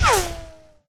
bullet, ricochet; weapons

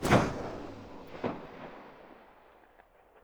ak rev; weapons

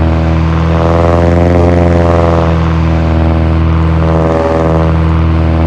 low, engines; SKYLINE

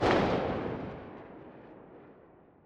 mp5; weapons

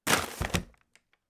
trash

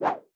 Whoo

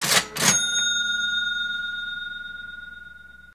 cash reg open

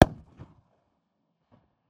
nagant dist; weapons

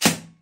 airsoft; weapons

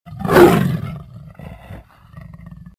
lion; animals

